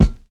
fat bass drum a sharp key, bass drum sample tuned to the A# note. Loudest frequency: 229Hz